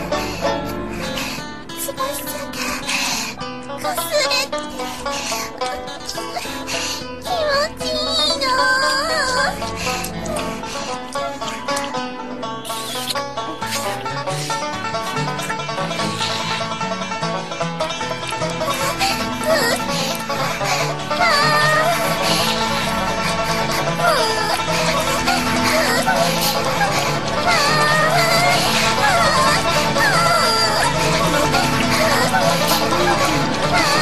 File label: BANJO FINGER; Master Bacon